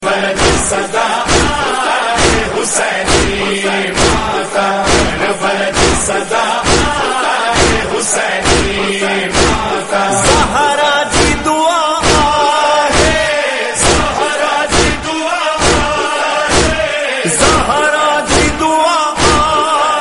noha hussaini matam tune ringtone

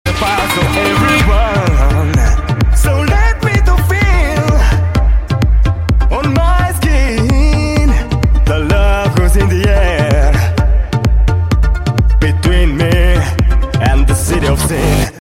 arabic house ringtone

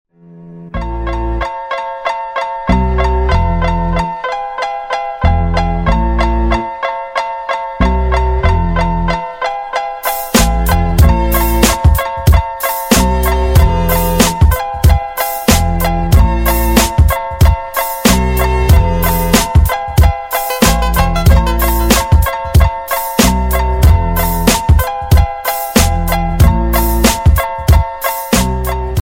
english song instrumental

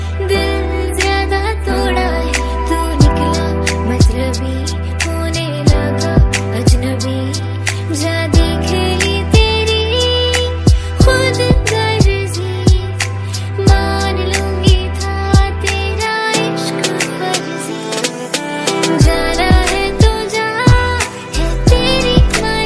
bollywood ringtone

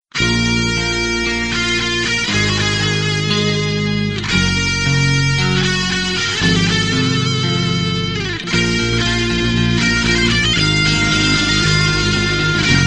distortion ringtone

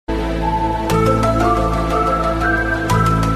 sony x whistle new ringtone

